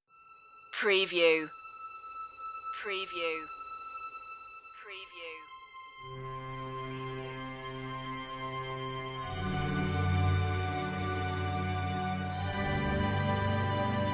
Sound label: orchestra only